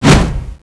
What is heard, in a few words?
ghost attack